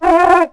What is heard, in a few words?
Call, Dino; Ourano